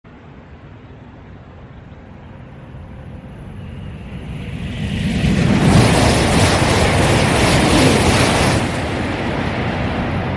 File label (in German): Zug donnern